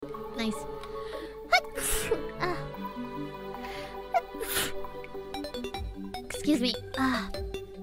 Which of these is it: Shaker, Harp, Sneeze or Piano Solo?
Sneeze